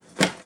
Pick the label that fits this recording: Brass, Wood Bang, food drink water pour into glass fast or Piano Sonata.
Wood Bang